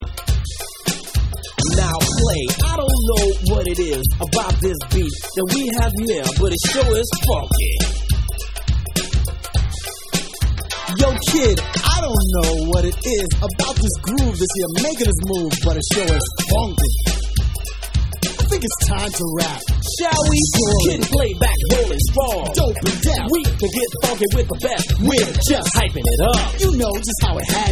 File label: Vocal Dub